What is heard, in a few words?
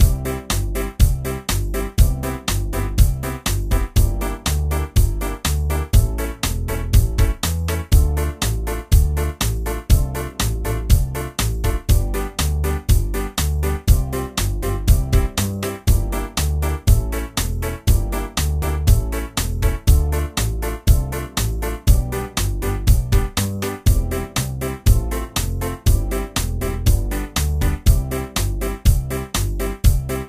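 mischief stroll, map themes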